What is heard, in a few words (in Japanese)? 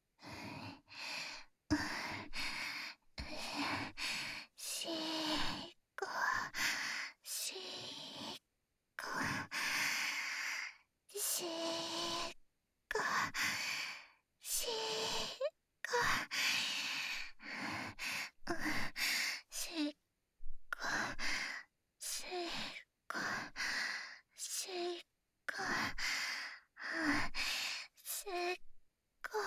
手コキ（しこしこ・センター）（SEなし）手交